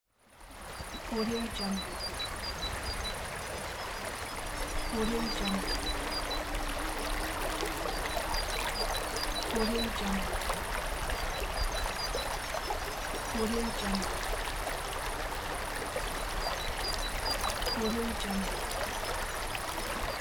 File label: waterfall ambience with birds singing